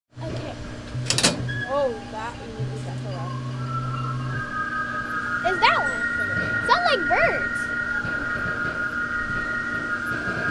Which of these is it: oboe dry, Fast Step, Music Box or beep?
Music Box